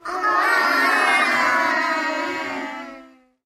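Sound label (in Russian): zvuk umileniya detey, sound of children's tenderness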